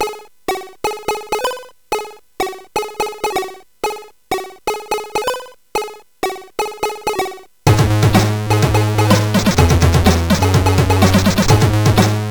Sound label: Rap, sid CSG8580R5